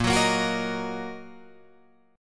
Bb Suspended 4th Sharp 5th Guitar Open F, Bbsus4#5 chord; Bbsus4#5 strummed; 10,13,10,13,13,10